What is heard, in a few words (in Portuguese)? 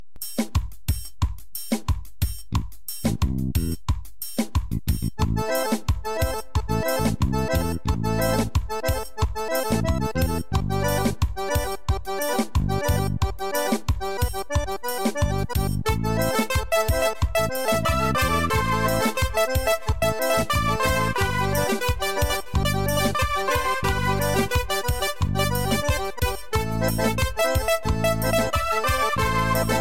forró solado